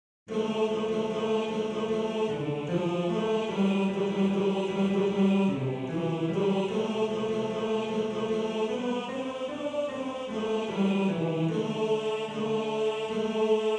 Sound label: Bass 1, maennerchor